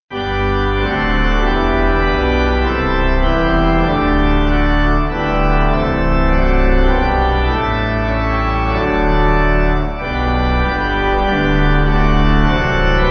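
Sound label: Pipe, Pipe Organ backing; At Your Feet Our God; Laus Deo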